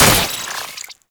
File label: Mech, Infested Arm Cannon, Weapons; Warframe; Coda Bubonico